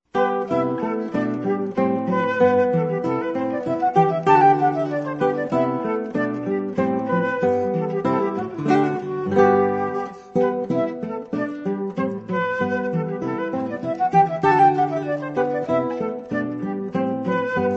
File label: Guitar Flute Duo